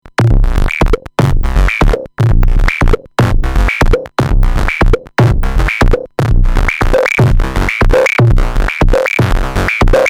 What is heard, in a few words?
moog sequence; arturia